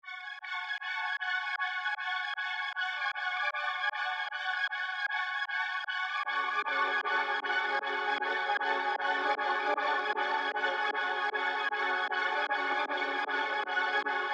154 BPM F# Min, Melody, Trap; Cymatics; 2022 Melody Collection; Trap Pt 2

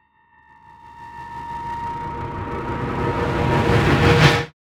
Riser

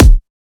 Kick, Dubstep; Dark Matter

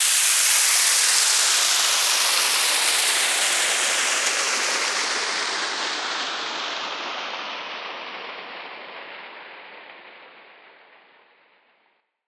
Downlifter Rushing; One-Shots; SFX; Ghosthack; Color Bass Essentials

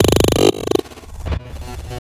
Glitch

